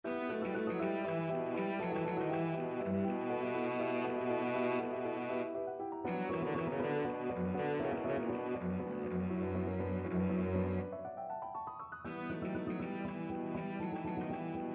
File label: scherzo, cello